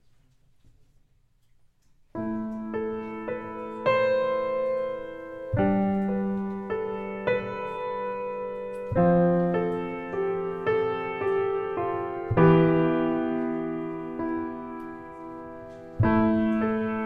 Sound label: A Shepherds Flute, recital